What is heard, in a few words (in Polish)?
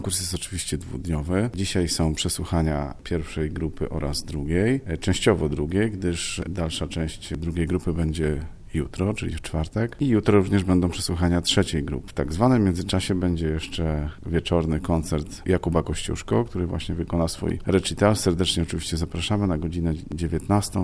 gitara